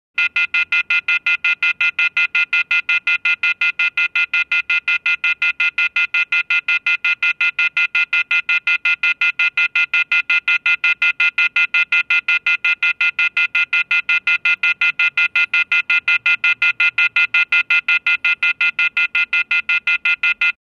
Short phone beeps; telephone

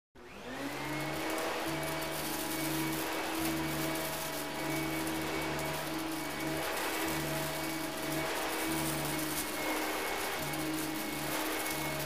Vacuum cleaner

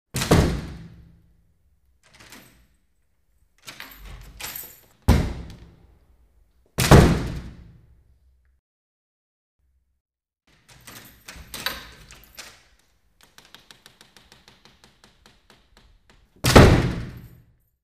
Wooden door opens, closes

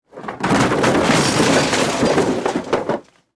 Breaking furniture, wood, blows, chips fly

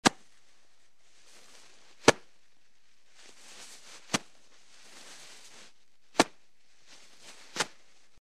Tearing sheets toilet paper

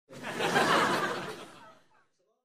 Laughter of a small crowd

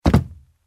Jump in shoes on a wooden carpeted floor; steps

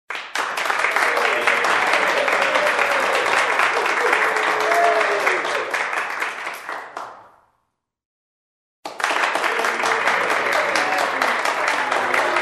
Applause from a small crowd indoors; standing ovation

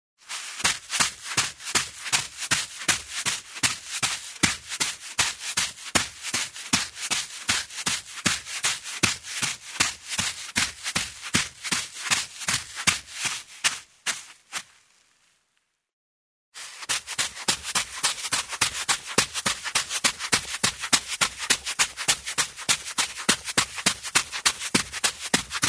Running on dry sand barefoot; steps

